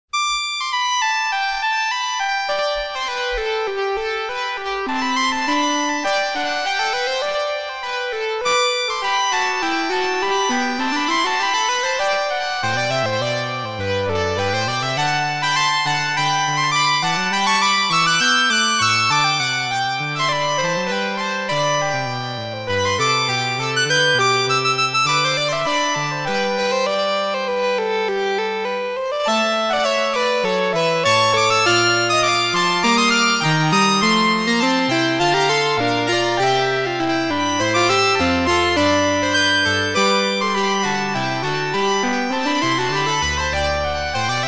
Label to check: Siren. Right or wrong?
Wrong